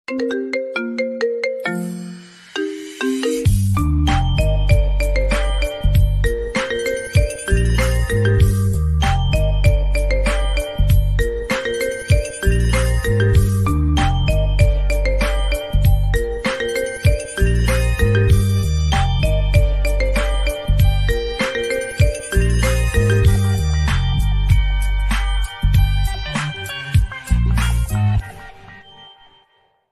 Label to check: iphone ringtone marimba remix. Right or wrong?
Right